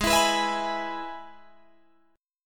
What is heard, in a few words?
G sharp Minor Sharp 5th, Guitar, Open G; 18,21,21,21,21,21